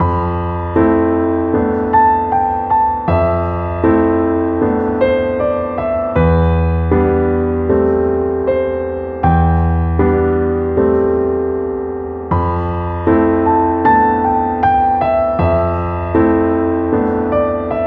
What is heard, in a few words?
80bpm